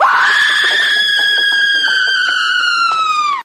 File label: scream